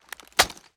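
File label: foley assault rifle ak47 inspect; weapons; wfoly ar ak47; viper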